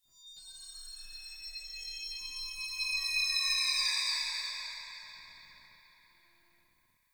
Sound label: Bell Weep, Ambience, Interior; Warframe; Orokin